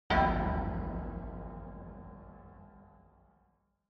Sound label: Negative High; Onoma